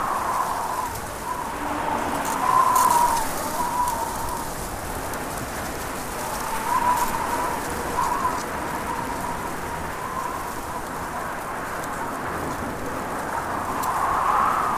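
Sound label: free spins forest ambience; wild worlds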